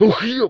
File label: dolg hit; STALKER; customnpcs